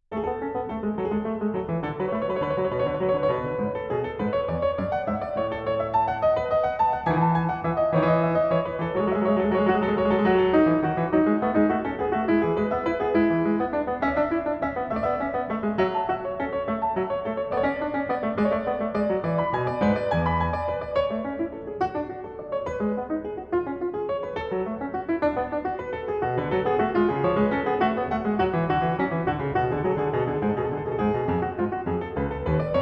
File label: Invention No. 12 in A Major; BWV 783